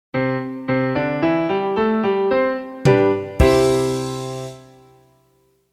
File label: Establish C Major; McGraw-Hill; Establish a Key